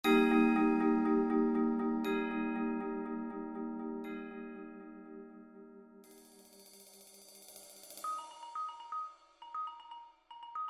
Percussion Ensemble 6 Players, Instrumentation: Bells, Xylophone, 2 Marimbas, Vibraphone, Chimes